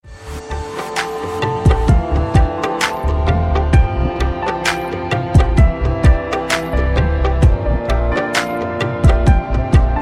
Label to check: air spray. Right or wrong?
Wrong